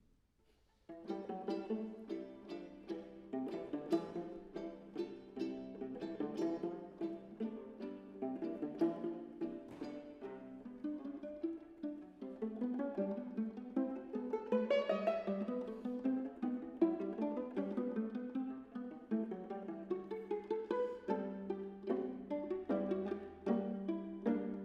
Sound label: Duos Pizzicato; 44 Duos 1931 XLIII.